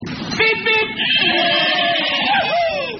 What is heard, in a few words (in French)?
bip bip